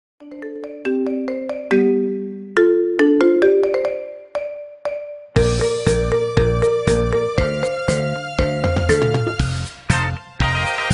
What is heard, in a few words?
marimba ringtone remix